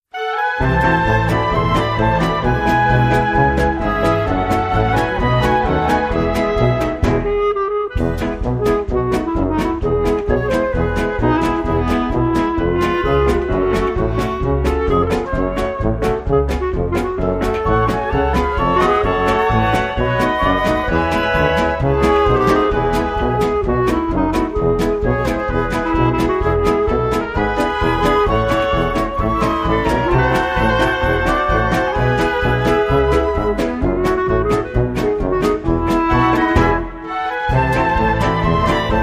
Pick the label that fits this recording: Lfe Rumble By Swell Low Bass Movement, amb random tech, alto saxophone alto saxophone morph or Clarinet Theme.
Clarinet Theme